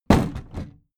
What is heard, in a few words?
big plastic case lid put down heavy